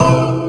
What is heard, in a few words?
Half Time, Hits and Bits, Drum Bass; Zero-G; Total Drum Bass